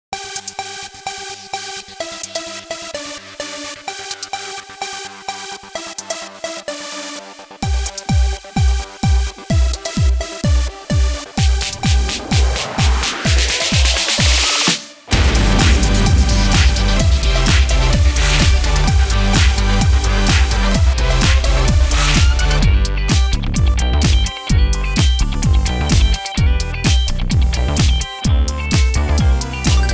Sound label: KARAOKE; NU-SPARK